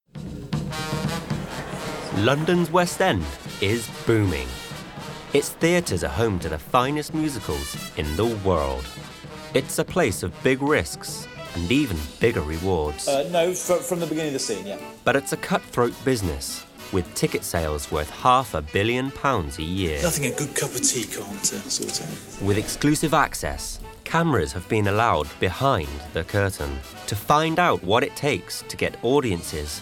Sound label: musicals